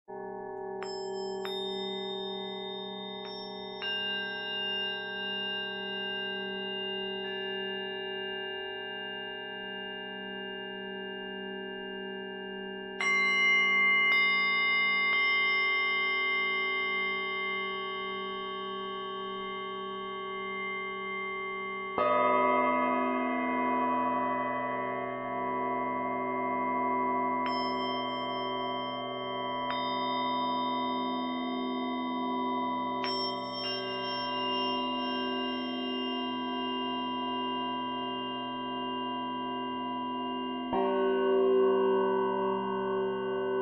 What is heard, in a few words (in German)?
klangpyramide